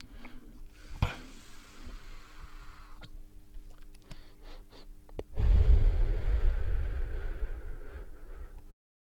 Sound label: Smoke Inhale And Exhale